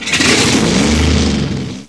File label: diesel start; hl2